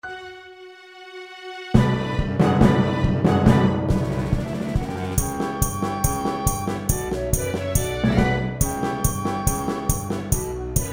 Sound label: Orch